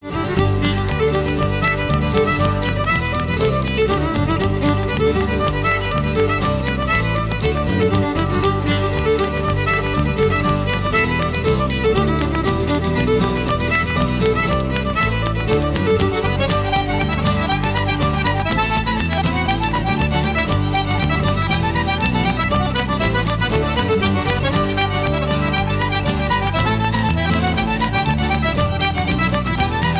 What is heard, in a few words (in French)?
Trio Ré Sol La